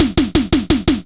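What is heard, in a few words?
Electronic Snare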